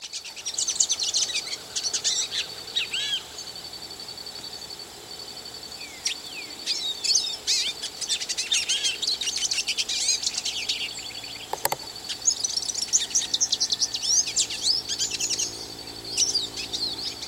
canto do pintassilgo de cabeca preta

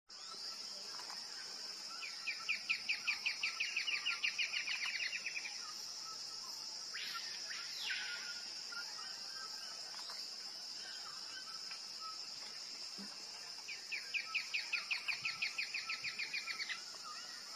canto da choquinha de bico curto

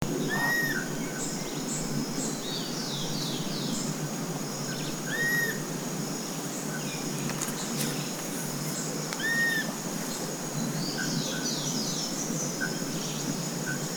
Irré

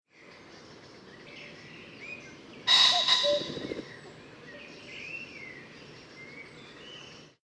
Canto do Faisão

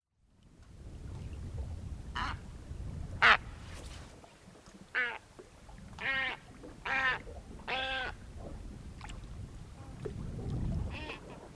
canto do albatroz

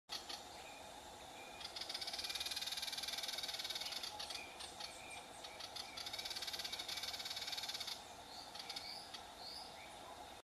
Canto do trepador sobrancelha

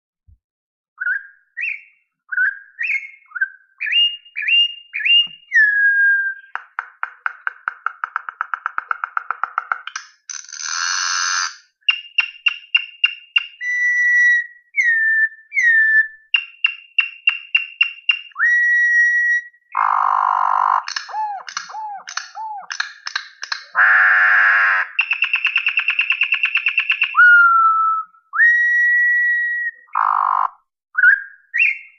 Canto do Corrupiao Canto Mateiro

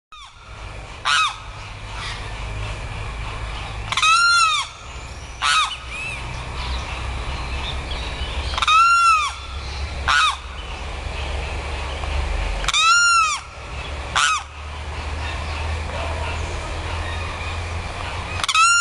canto do carao

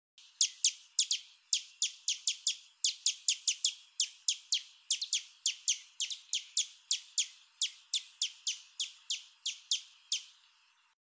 Canto do tico tico de barrete ruivo

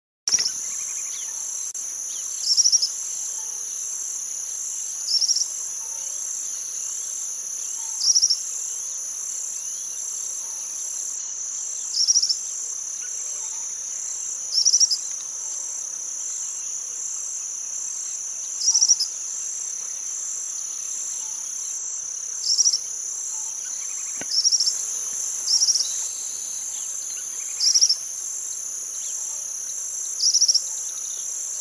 Canto do suiriri cinzento

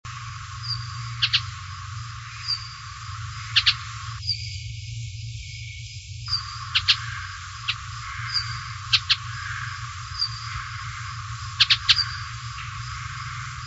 Canto da Sialia mexicana

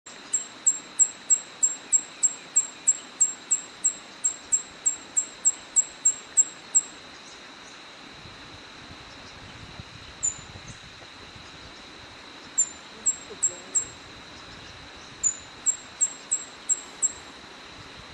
Canto do fura flor indigo

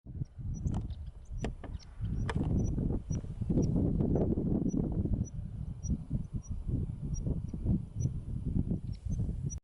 Canto da maria da praia

